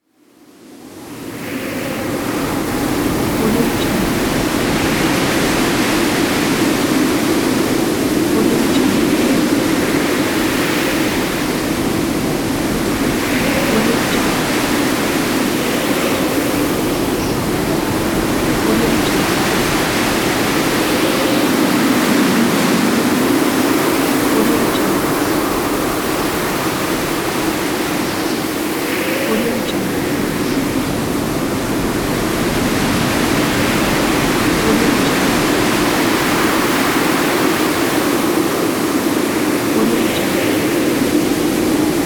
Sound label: snow storm